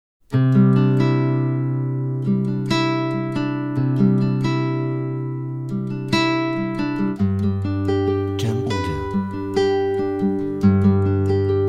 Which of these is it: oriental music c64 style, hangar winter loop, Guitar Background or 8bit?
Guitar Background